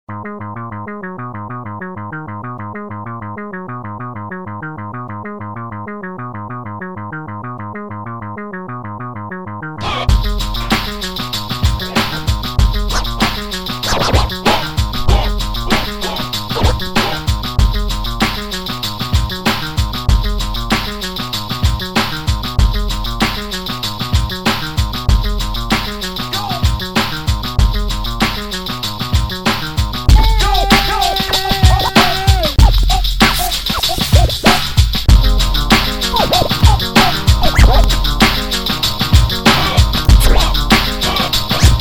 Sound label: drumroll; Plotter: Second Order Differential Equations: Disk 2